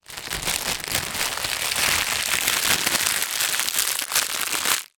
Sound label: foley celephane wrapper thin movement; zapsplat